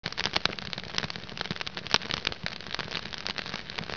campfire

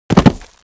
zombie dog jump

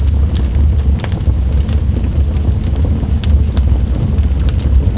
torches, ambience

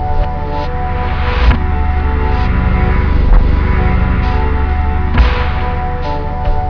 odd loop; svencoop; nlab

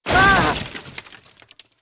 trap

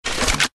select Bowcaster; svencoop; portalhouse

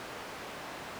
cast

